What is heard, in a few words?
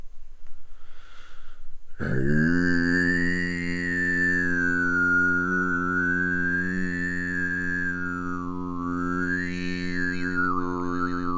Storm